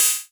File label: METAL Hit